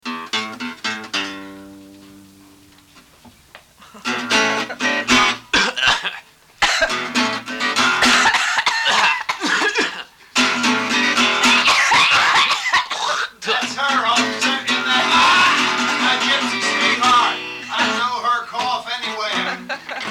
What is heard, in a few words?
Coughing Blues